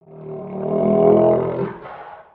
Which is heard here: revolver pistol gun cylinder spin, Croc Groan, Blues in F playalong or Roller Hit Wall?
Croc Groan